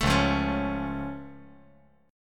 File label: Db Augmented, down; strummed; JGuitar